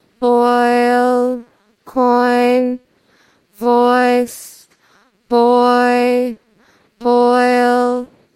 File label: singing